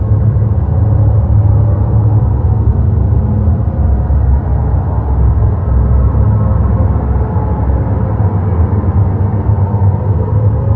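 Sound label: drone loop, ambient atmosphere; video games; source engine; portal2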